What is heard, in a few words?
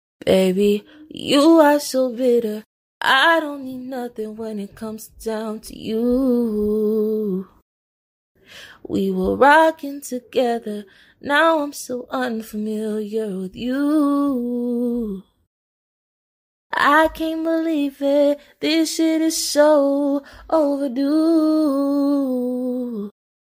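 pop, 65, G minor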